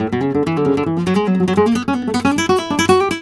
c#, Flamenco Guitar Picado; Picado 2

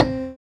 PNO, Electrics; Club-50 - Foundations Roland; Rds & Wurly

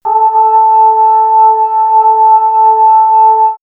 Swirls Vox, pads; NumbVox; X-Static Goldmine

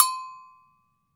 AGOGO BELL, Brazil; AKAI S6000 CD-ROM - Volume 5

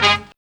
STAB

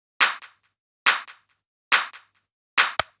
drum Loop 140BPM Clap

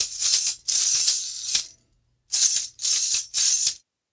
Tamborine Jingles, Arabic; Sonic Foundry (Sony Creative Software); Continental Drift Aftershocks

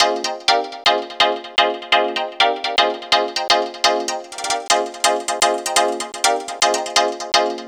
SYN 125, House synth loops

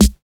DRM OLD, dance synth

